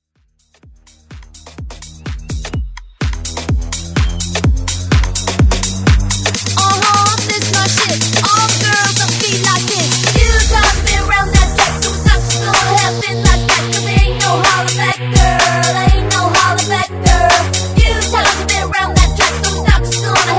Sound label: Mash Up